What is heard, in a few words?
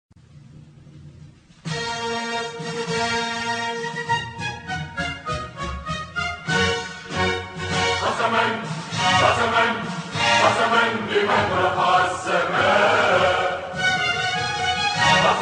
national anthem ringtone